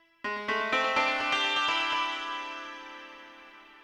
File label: ethno, 125, A